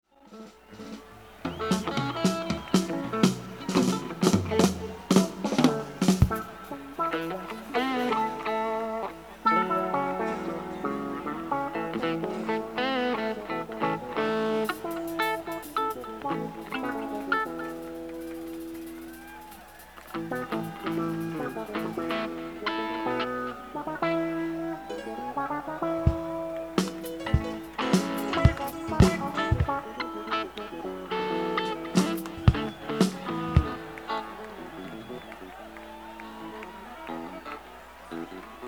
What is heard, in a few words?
Tuning